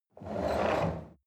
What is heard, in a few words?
chair wheel roll on floor medium squeak